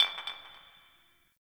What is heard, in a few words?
Garbage Glass Drop; Warframe; Ambience; Nineteen Ninety Nine